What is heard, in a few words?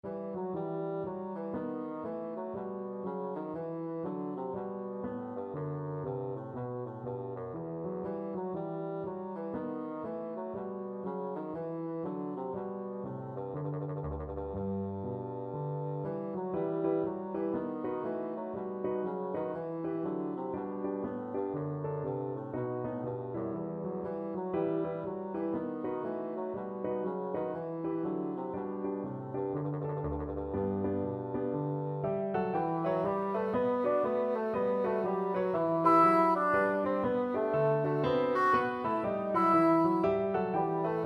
bach bouree, bassoon